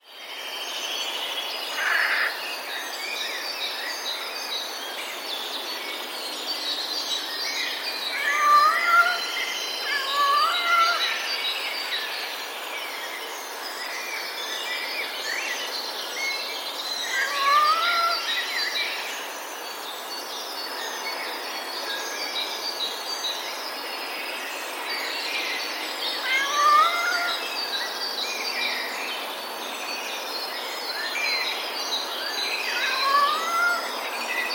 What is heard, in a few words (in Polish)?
sojka; niby miauczenie kota, ale za bardzo melodyjne